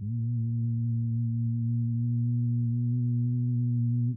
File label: hum; Notes